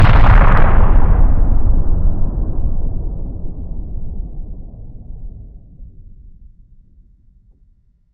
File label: Huge explosion in distance; Hovl Studio; SFX Battle sounds; WhiteMan Unity2D